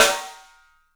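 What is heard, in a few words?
PICOLO SNRS, snare, drums; 3D Sound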